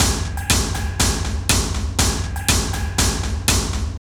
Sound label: Drum Loops